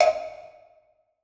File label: Chest Hover; netent